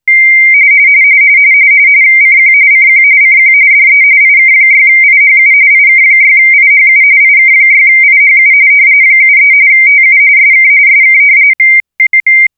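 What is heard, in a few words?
RTTY, Hobby Radio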